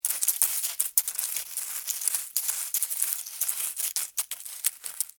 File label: Insect Dig Dirt, Bug, Movement; Animal; Insect Movement Sound Effects